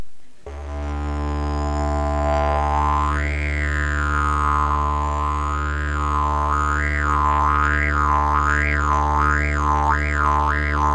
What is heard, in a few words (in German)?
Hoerprobe Zungenspiel, didgeridoo